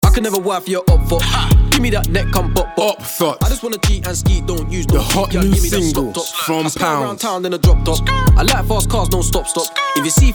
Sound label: music